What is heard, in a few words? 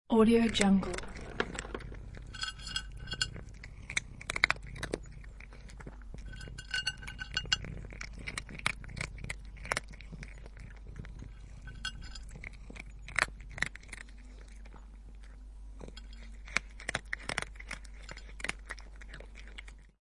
cat eating cat food